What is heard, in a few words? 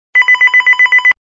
Alarm Digital